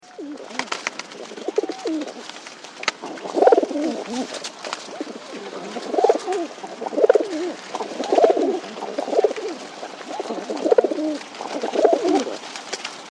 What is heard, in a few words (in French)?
pigeon; pigeon biset